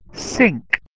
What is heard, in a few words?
sink